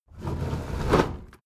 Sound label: window roller shutter close soft single pull